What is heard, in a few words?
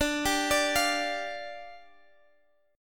D-Minor Double Flat 5th, D; arpeggiated